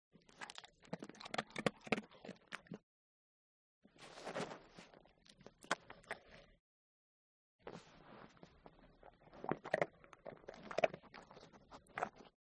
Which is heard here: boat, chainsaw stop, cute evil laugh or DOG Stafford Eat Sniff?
DOG Stafford Eat Sniff